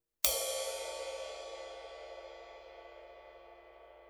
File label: med ride 20 bow, cymbal; sabian